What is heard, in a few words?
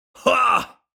english warrior laughing vocal funny laugh male 30s voice